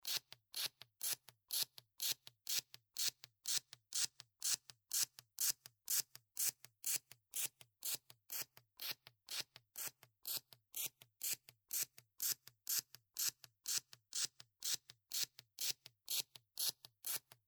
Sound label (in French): Scotch